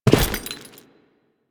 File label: Step Default, Footsteps